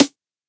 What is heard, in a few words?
DRUM ONE SHOTS Snare